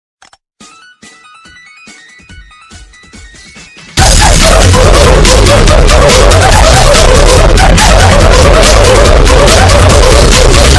oof oof oof

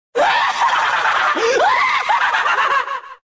laugh; fleetway

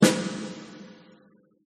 drum

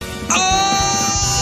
aughhh; roblox

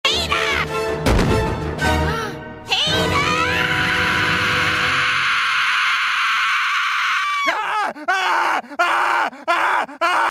aaugh; lois

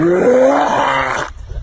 dog barf

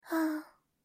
sigh

